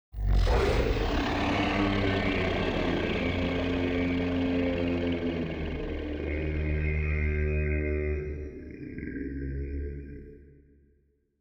侏罗纪主题区域, 音效

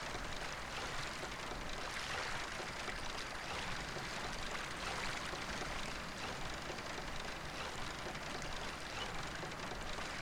明朗的村落fx, 环境音效

